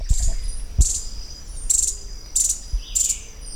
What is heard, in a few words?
Cranioleuca obsoleta playback; response to playback; recorded Isla Yacyreta, Departamento Misiones; Paul Smith October 2009